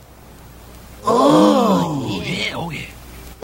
GASP OH YEAH

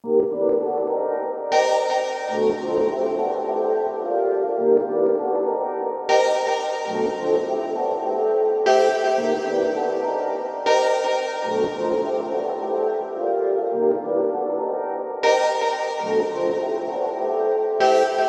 melodic chill synth sequence summer - gm; instruments; loops

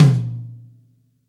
verby tom drum sample B key

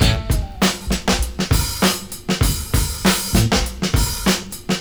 100 bpm breakbeat sample a key; drum loop

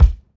original bass drum single shot c key

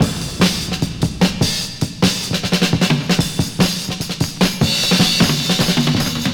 151 bpm drum loop f key

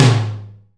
reverb tom drum single hit G sharp key

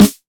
dark sounding acoustic snare g sharp key; snare drum samples

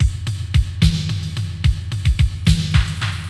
73 bpm drum loop sample f sharp key

